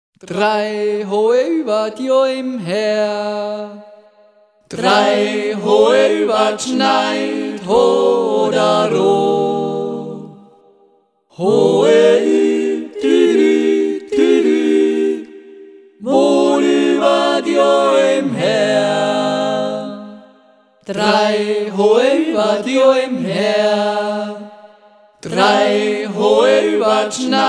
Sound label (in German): jodler